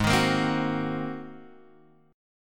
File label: G sharp 9th, Guitar, Open G